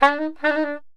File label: SAX, Balkanish Traditions